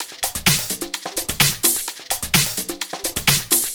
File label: Houseloops 128BPM; Vengeance Samples